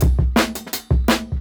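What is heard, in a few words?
Jungle Breaks, 170 bpm; Original Jungle Breaks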